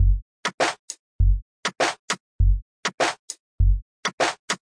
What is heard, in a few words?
100 bpm bass and crunchy crunch, drum loops and breaks; 091 to 100 bpm